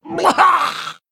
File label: Male Murloc Death